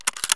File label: sv98 bolt out; Escape from Tarkov